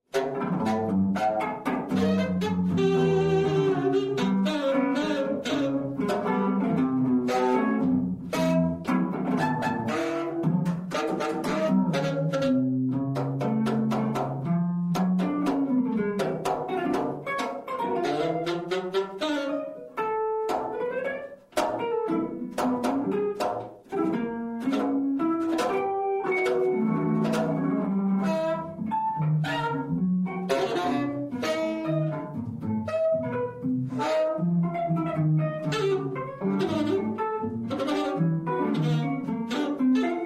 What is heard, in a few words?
Tango